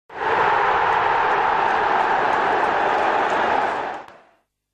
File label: goal sound; bet 365